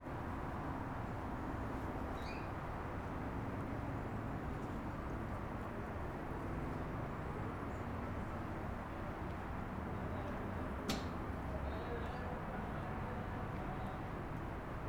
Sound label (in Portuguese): Ambiente externa de rua residencial no Cruzerio a tarde; ambiencia domestica